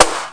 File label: small good fx, snare